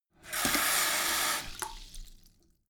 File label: sink fill splatter hard short; haus-garten; badezimmer